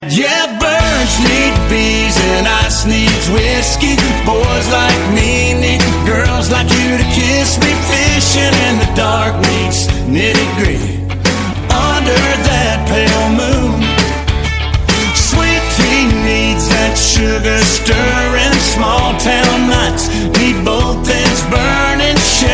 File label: knocking boots